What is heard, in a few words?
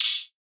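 open hi-hat, Drums; HiHats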